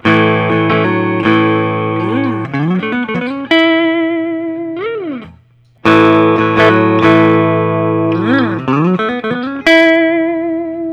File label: collings i 35 deluxe tiger eye